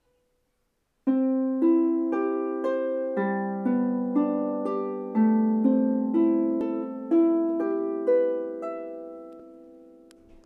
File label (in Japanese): 四分音符でコードだけを弾く